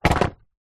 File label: industrial leaf blower electric small set down ground